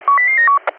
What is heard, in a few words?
recieve